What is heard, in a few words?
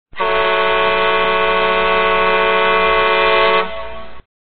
horn, trucks